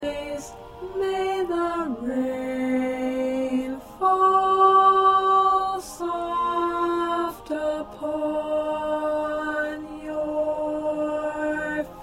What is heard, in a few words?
Alto 2